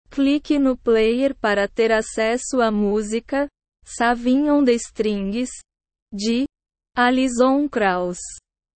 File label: sawing on the strings